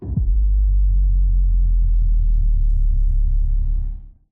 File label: Giant Floor Panel Lower Rotate LFE; Boomtown; Sideways Town; Titanfall 2